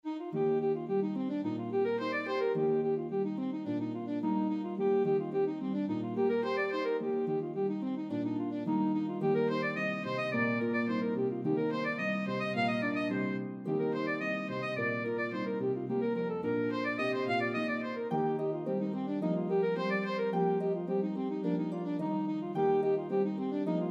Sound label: Alto Saxophone Harp, Duet for Eb Alto Saxophone & Harp; This reel is charming as a Wedding Recessional or for a Festive Event.